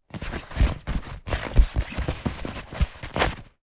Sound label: Inflatable armbands handling friction